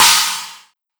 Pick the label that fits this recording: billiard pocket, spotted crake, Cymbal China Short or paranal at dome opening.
Cymbal China Short